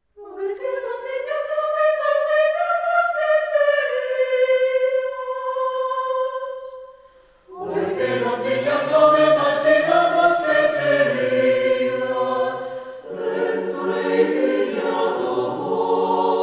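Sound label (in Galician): Música coral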